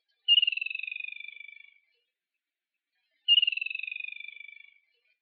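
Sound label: Swinhoe's Rail, watarase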